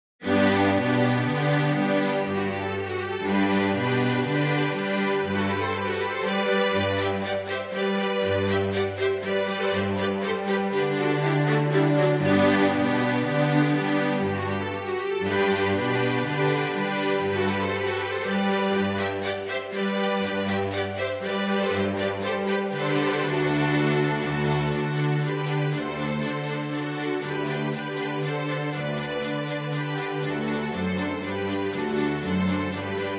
Divertimento in G Major

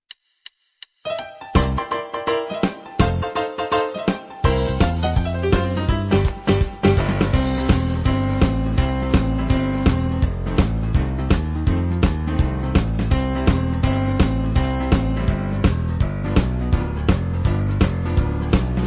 Keyboard Samples

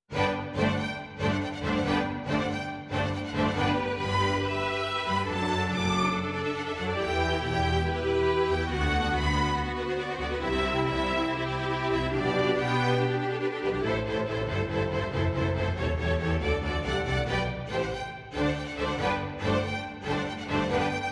Divertimento for Strings

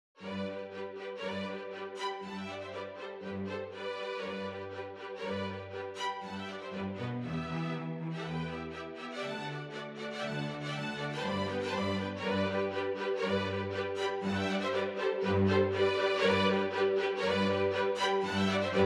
Turkish March String Orchestra

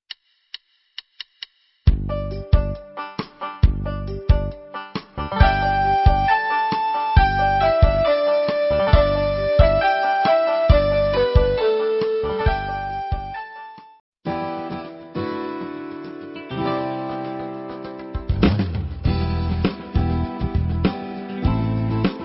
String Street Starters Sample Backing Tracks

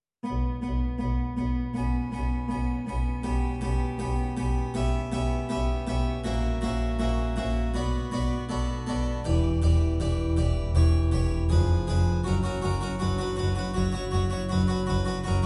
Mandolin Orchestra; Venezia

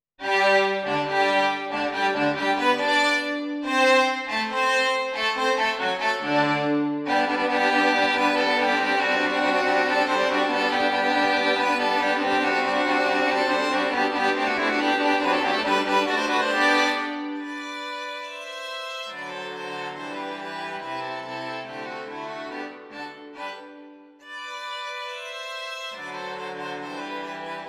Viola Quartet; Allegro from Eine Kleine Nachtmusik

